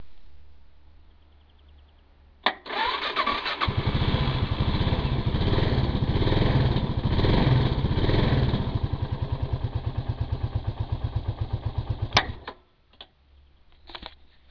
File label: motor